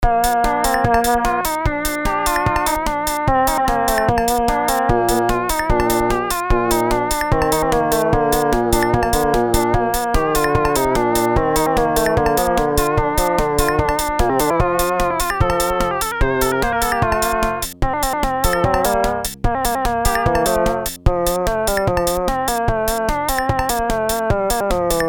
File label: Casio SK1 song; synth demos